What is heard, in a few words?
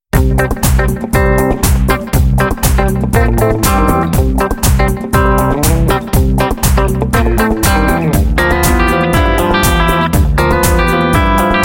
toy 120bpm; hartwigmedia